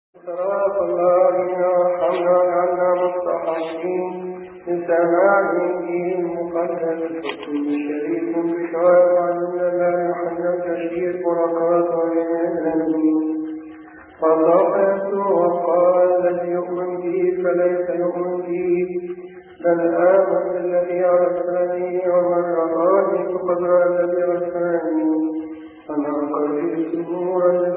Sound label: Arabic; holy week; Anagil Elbaskha; Engil Elsaa Elhadya Ashr Men Leylt Khamis Elahd; Der Elbaramous